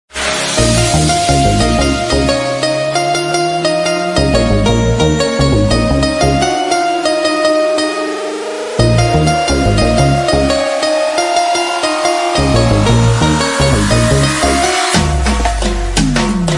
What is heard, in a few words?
Romantic Guitar Ringtone